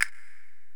Korg x5, Drums

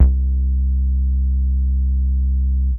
Bass Synths hits and octaves

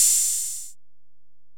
HiHat, Alesis DM-PRO

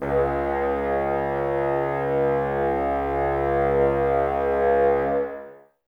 Bassoons, C#2; Wood Winds; Miroslav Vitous; Woodwind Brass Ensemble

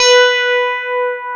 PIA DX E-PIANOS; Sound & Vision; Gigapack I CD 2; PIA DX-Pianos

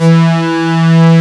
OBX STRING E3; Vintage+

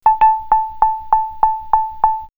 Lift Tone, synth fx; StarTrek; X-Static Goldmine

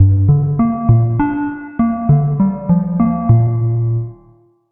Melody, IDM

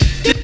fx vox; E Lab; XTc Files of House

